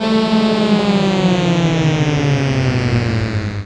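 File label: synth, Production Effects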